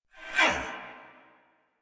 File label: Attack Effort; Warframe; Dax Knight; en